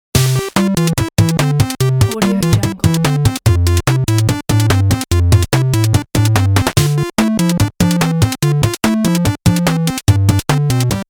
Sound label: 8 bit retro game